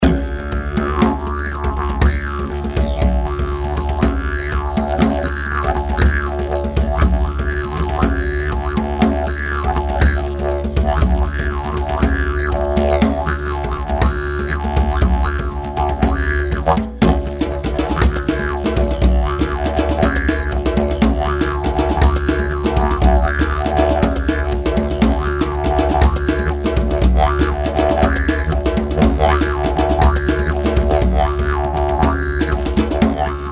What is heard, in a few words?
didgeridoo loop